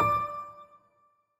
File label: piano; osu